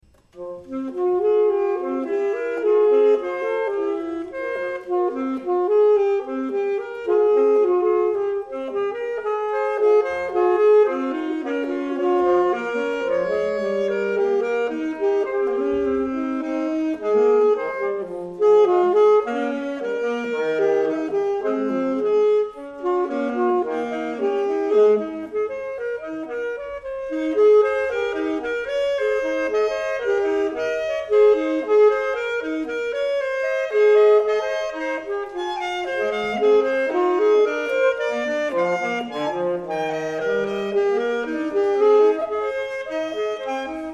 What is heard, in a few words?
sax Bach invenzione a 2 voci n 13